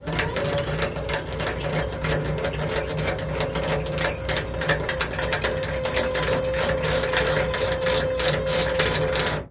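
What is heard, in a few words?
anchor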